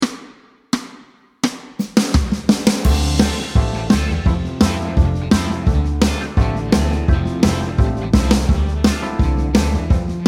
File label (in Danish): Akkorder D mol 3 trin C; del 1 kap 4